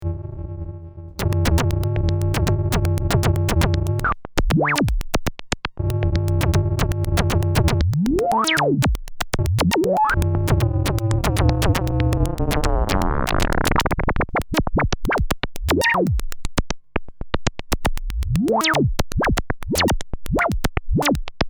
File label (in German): sequenz